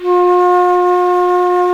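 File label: F3 flute, pipe; AKAI S-Series CD-ROM Sound Library VOL-8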